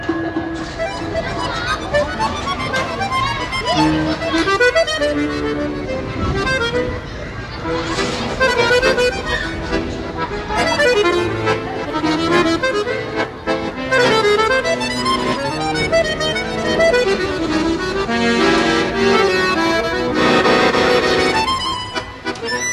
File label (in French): Musique accordeon